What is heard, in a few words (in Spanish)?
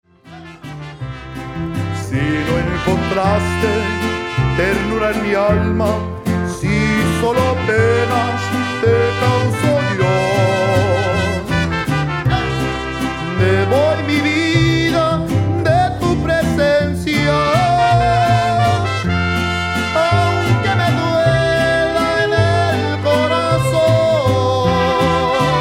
mariachi